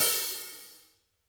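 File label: hi-hats, Interactive Drum Kits; Sonic Reality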